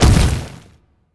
Stone Kick; helltaker

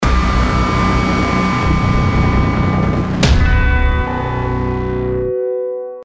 Jingle Ambush Jingle Outro; The Binding of Isaac Rebirth Afterbirth

